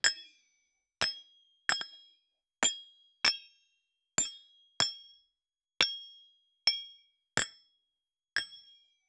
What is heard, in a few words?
crafting alchemy stuff